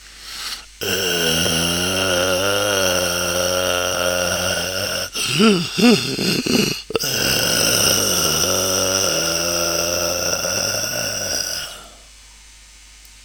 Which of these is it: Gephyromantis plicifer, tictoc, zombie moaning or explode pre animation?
zombie moaning